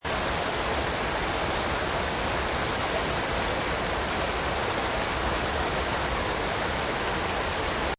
Waterfall Loop, Waterfall loop: Medium size waterfall, seamlessly looping sound; Rivers; Nature; River Sound Effects